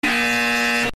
false sound effect

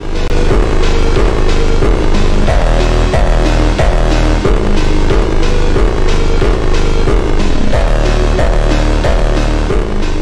phonk; doomslayer

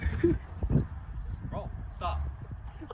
rage

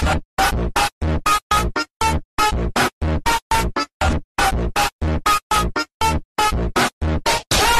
scream funk

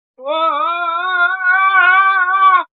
bot scream

